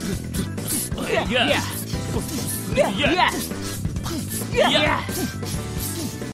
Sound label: Anniverary Beat